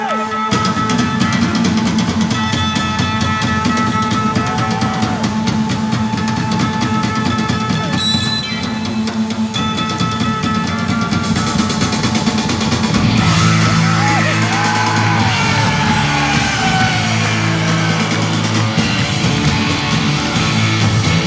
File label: Drum Solo, Live; Springfield 1975; December 14, 1975